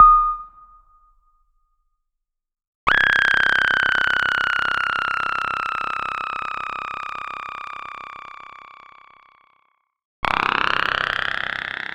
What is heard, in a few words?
5U Modular FX